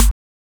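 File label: hi hat very fast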